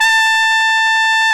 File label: BRS AKG TP, Tp 6 AKG Jaz; Roland L-CDX-03